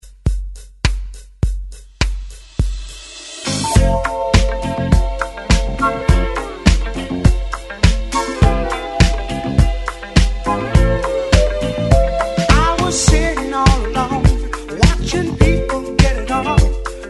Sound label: 70s Re Drum 103 Bpm; DJDOLLS